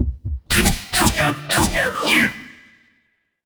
magic scifi laser

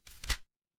paper fabric page turn

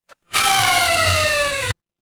mechanical gear

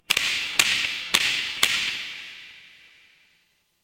fire electric spark

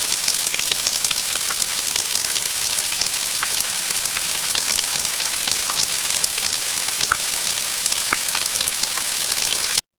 ambience rain

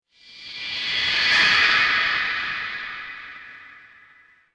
magic scifi spell

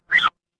cartoon slide whistle

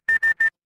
ui alert

